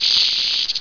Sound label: snake pain; wanted